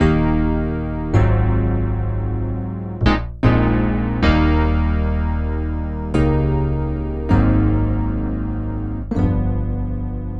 Electric Piano - Punchy Pad

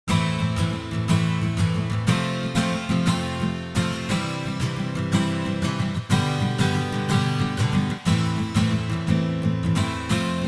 Reverb - Guitar Strumming - Ambient Sand; CRTIV Reverb

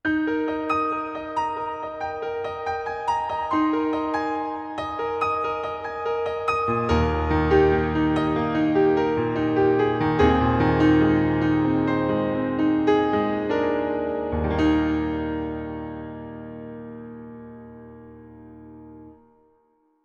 Acoustic Piano - Nice Hall; OldSkoolVerb

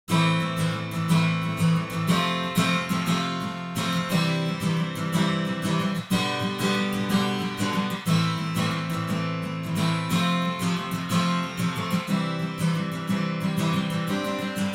Strumming Guitar - At a Distance; Spatifier

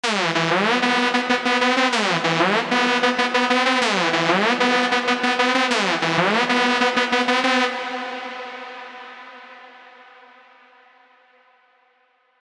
Reverb - Synth Lead - Long and Bright; Synth Lead 2; CRTIV Reverb

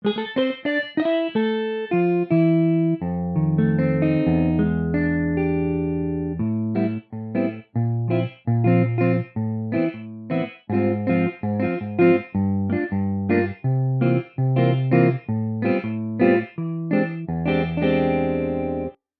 Jazz Guitar - Spatial Guitar; Spatifier

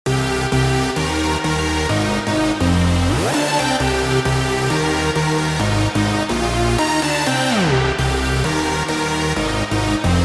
Reverb - Synth Lead - Bright Room; CRTIV Reverb; Synth Lead 1

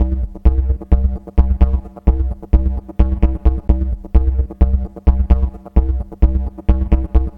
Bassy Riff - Club Is Pumping; Crunchessor

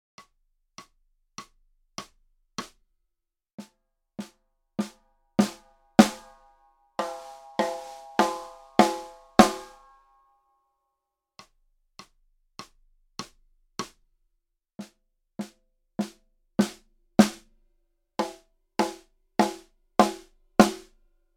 Snare Drum - Hickory Sticks

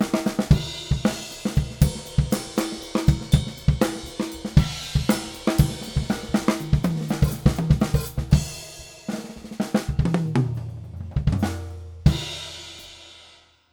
Acoustic Drums; TransGainer

